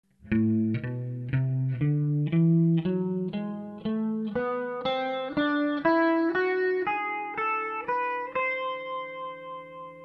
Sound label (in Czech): Ami melodicka, kytara